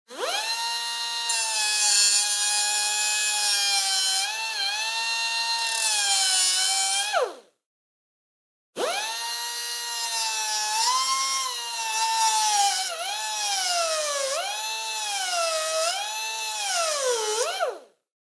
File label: Pneumatic Tool Saw Long Burst Metal Contact; noise creations